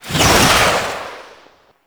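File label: Sniper Alt Fire Projectile Whiz By; DE; Warframe; Frumentarius; Exalted Sniper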